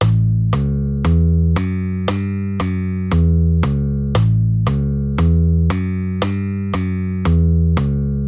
Figura tony A1 Cis E Fis G Fis E Cis

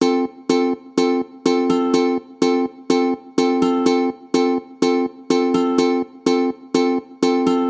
Rytmy ukulele tango staccato

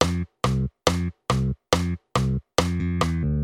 Basove figury polka s T do D

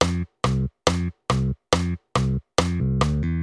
Basove figury polka na Fdur

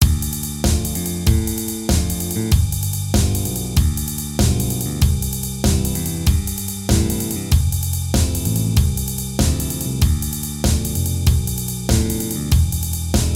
Slowrock var2 netriolyzakl bass

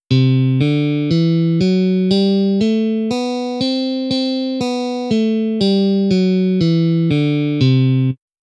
stupnice elektricka gitara lydicka

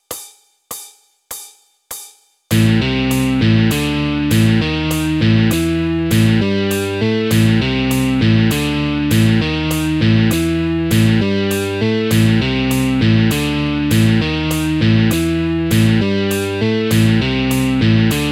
Motiv tempo 100

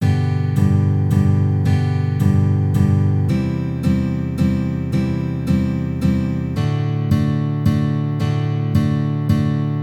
nacvik zakl akorov kadenci v rytmoch G dur valcik

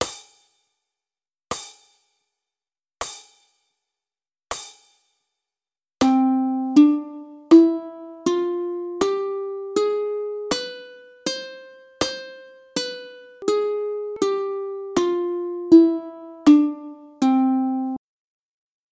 ukulele harmonicka dur C

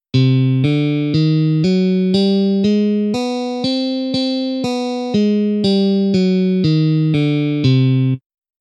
stupnice elektricka gitara ciganska mol

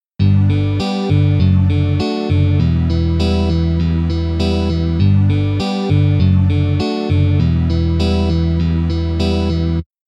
Slash akord G Df Emi

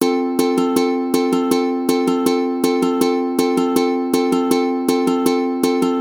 Rytmy ukulele

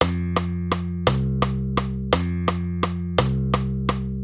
Basove figury valcik 3/4 doby

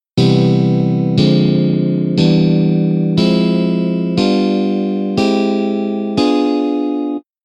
Harmonicka dur

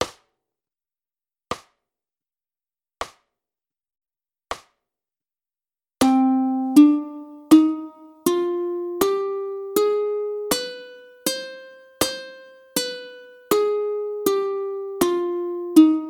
ukulele stupnice harmonicka

